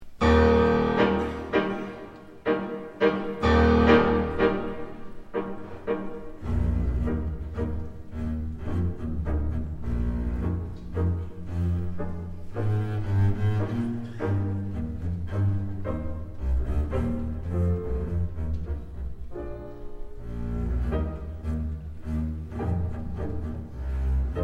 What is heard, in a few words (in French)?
Contrebasse